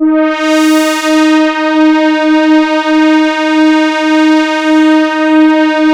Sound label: MONO PAD; PADS